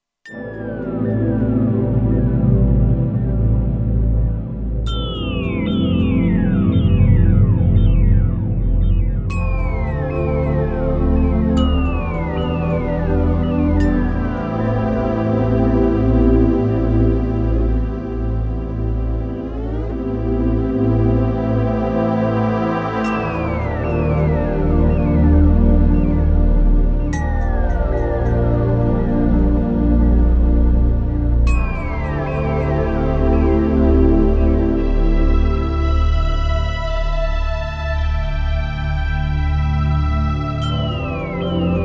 Ethereal Echoes; Factory Programs

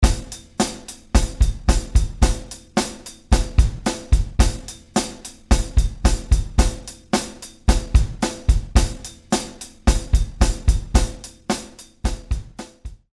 Motown Drummer; Apple GarageBand 2; Apple Loops

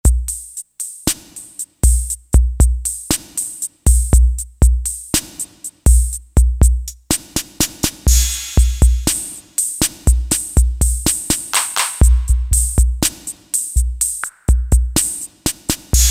CR-78, Factory Rhythm Sets; Roland SC-880

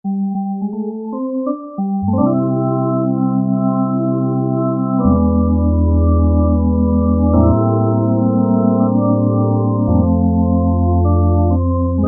Gospel, Drawbars

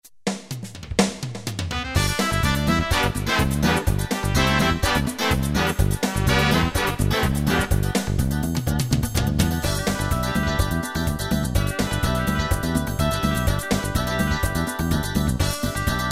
Hi-Life, Yamaha QY70 Preset Styles

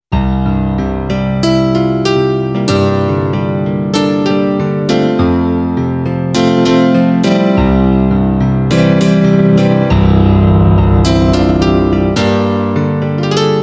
Piano2Low; Korg MSC-05S Piano

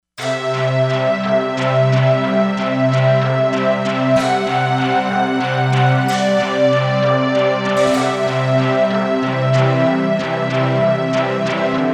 Synth Orch, Korg MSC-07S Synth; Korg MSC-07S Synth 2

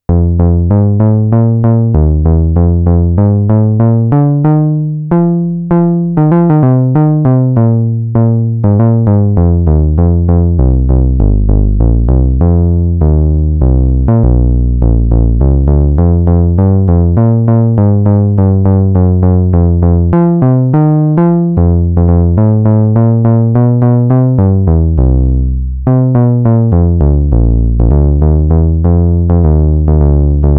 ELECTRIC BASS GUITAR, Roland SH-101; Factory Patches

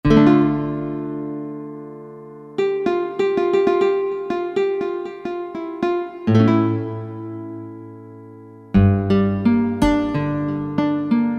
Nylon Gt, Yamaha A4000 Guitar; Bass

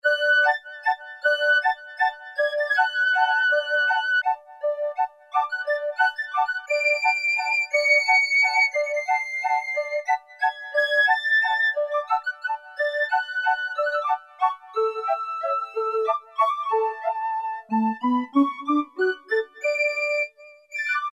RECORDERS; VZ ROM Card

